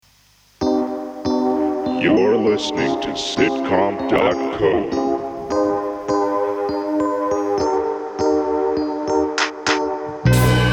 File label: Wobble